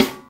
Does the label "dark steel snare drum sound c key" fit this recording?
Yes